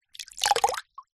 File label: nature water shallow light movement splash